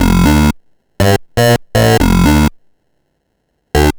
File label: Sh09 Bass Loop, 120BPM